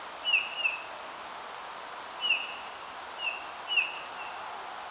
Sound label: Eurasian Bullfinch - call, Gimpel